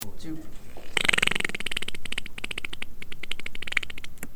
fart

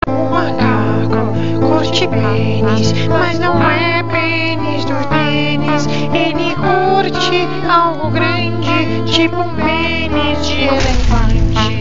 spain song

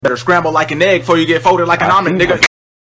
ehhrrh

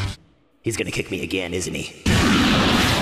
kick; DBZA Cell

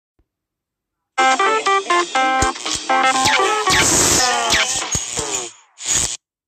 shocked; horror channel 2011; santa

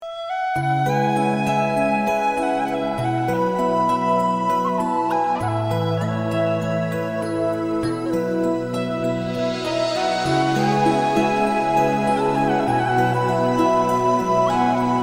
sad titanic song

